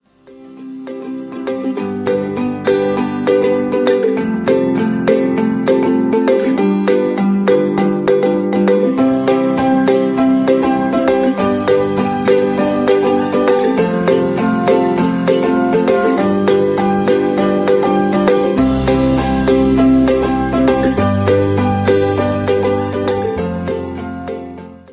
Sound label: music